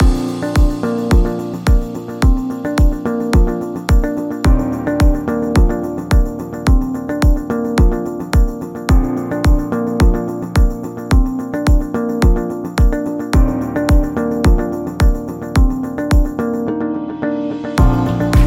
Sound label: Interview Loop